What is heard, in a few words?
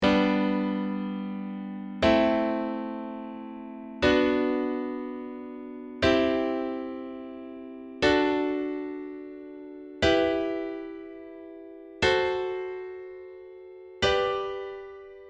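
piano chords G to G, circle of fifths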